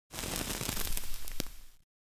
Cigarette lighting and burning close perspective

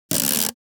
Scotch tape pulling a piece of strip

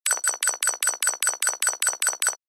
Urgent fast beeps

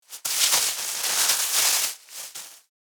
Grocery plastic packet rustling

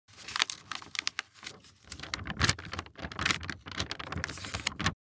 Paper rustling

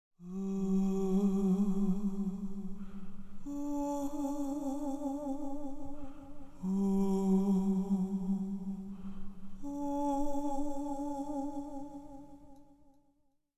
Ghost singing creepy and spooky

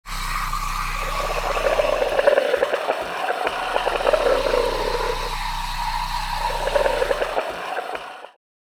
Milk frother milk bubbling; appliances

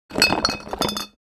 Rattle of glass bottles in trash can; household

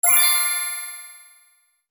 Fairy dust magic sparkles

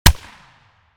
SPAS-12 combat shotgun single shot

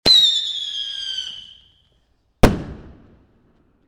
Fireworks two stage launch whistle and explosion

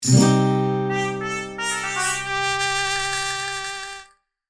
Spanish transition accent ole cartoon, guitar

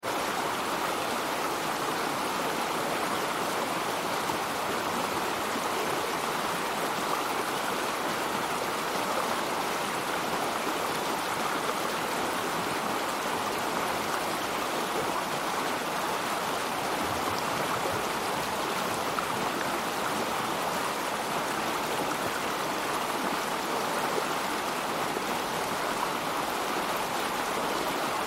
Colorado stony river with strong flowing water

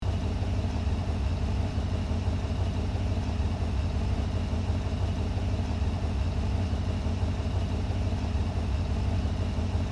Car medium speed driving interior loopable

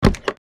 Small wooden door open; household

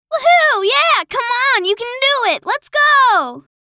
Teenage girl cheers and support; misc human

